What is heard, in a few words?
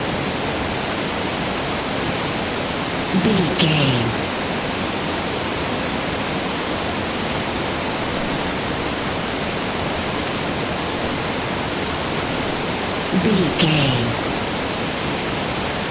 river stream waterfall flowing water loop